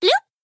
Bloop; HatKid

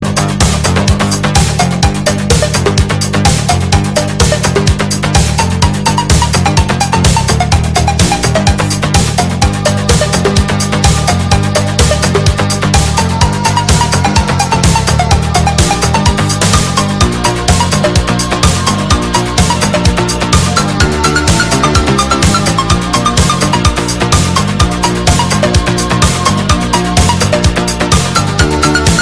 Popcorn

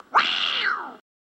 Funny Meow

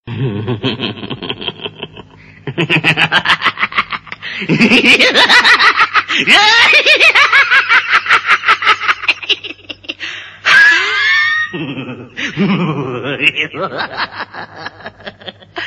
Laughing

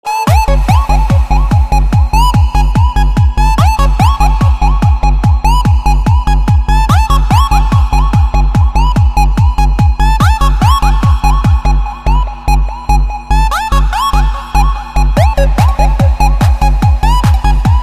Rave

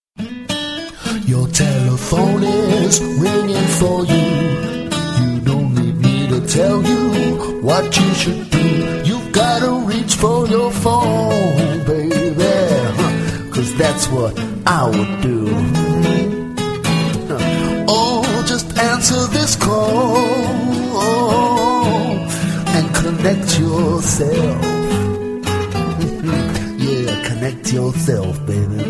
acoustic ringtone

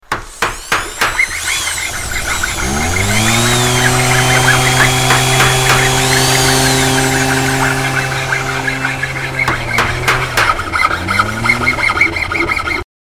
MORNING Alarm

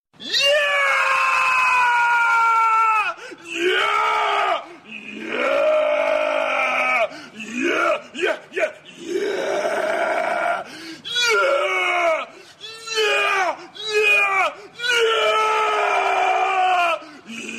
Yeeeeah

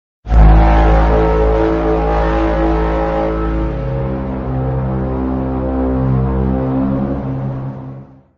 Tripod Sound

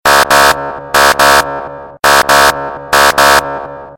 Police; Dubai

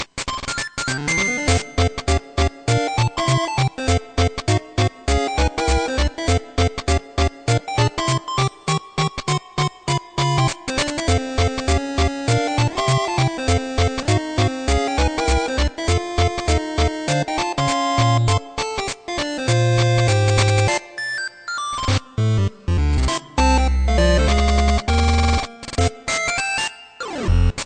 8 bit; Nintendo Land

